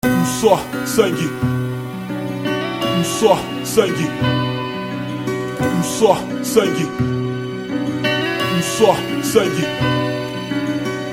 beat

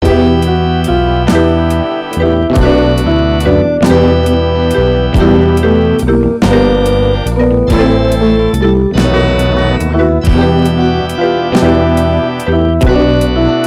jig; sick cat jig

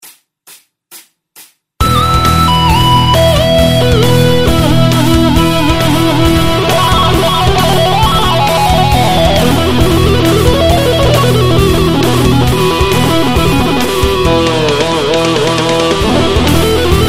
instrumental solo lead; Keystrumental

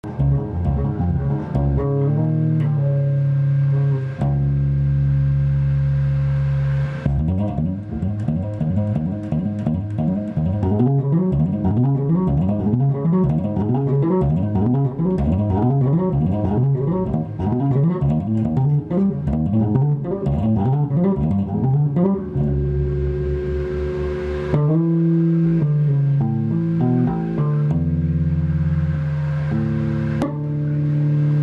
tapping atack

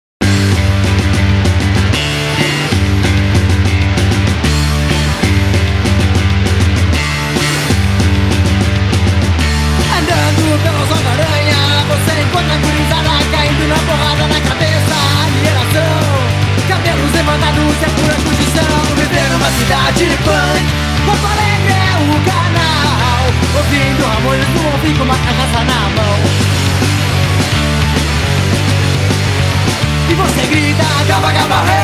punk

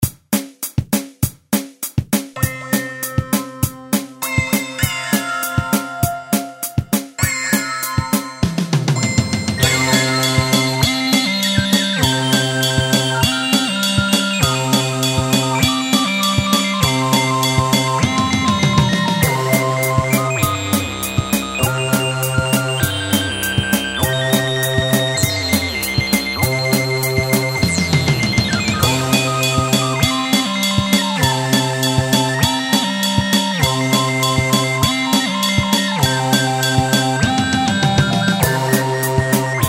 synth core

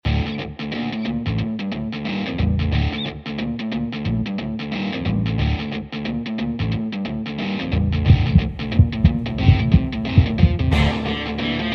cypher rap gangstar

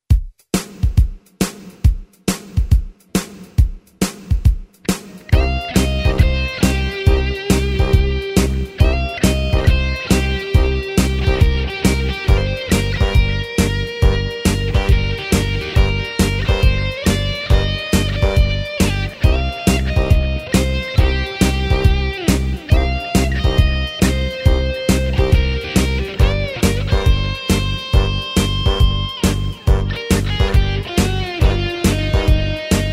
guitar

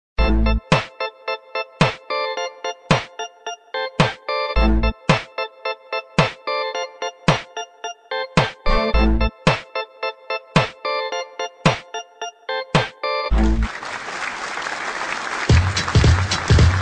old school beat; breakdance

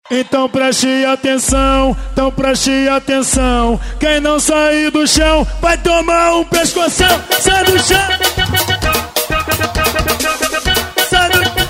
funk

